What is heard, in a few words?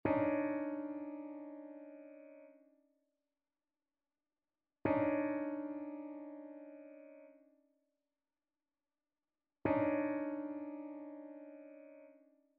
trichord; trichord identification